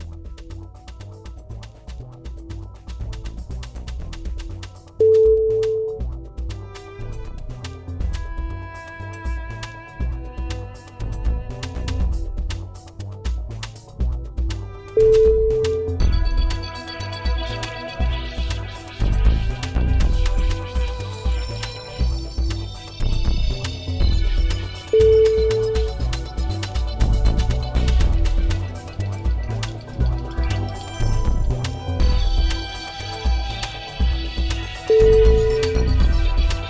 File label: the cat purrs